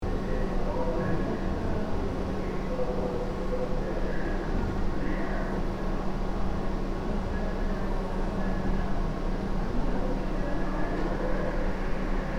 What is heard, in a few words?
Bedroom room tone ambience loop